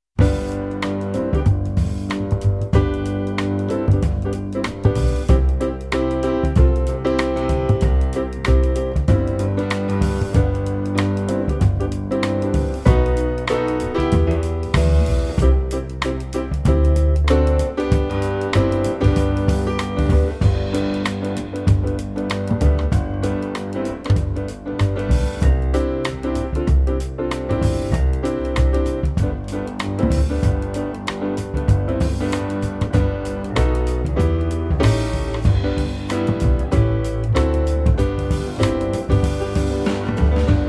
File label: PLAY BACK PRAISE HIM